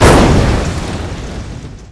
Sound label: explosion city; Atari Retro